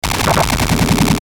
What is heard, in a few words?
Glitch Stab Fast Blip, Glitch Electrical High Buzz Descend; Production Element Imaging Element Accent Transition; Blastwave